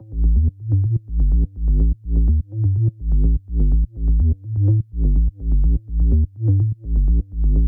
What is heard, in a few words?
125BPM ROLLING SUB A, Bass Loops